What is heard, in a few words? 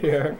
laughing